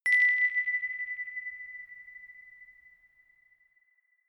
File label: notification glockenspiel rattle single